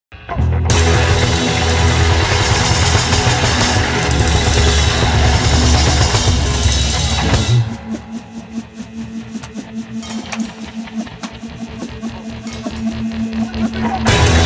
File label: crow solo